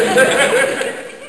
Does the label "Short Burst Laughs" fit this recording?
Yes